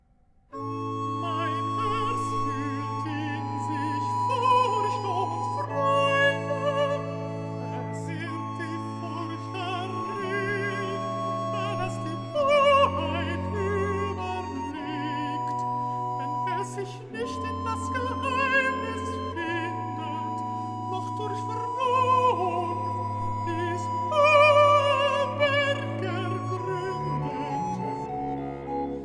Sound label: Recitativo (Alto); BWV 180; Schmücke Dich O Liebe Seele; Mon Coeur Ressent à la Fois; KantateTrinitatisPlus20